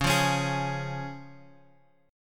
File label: C sharp Major, Guitar Open G; 11,10,11,13,x,x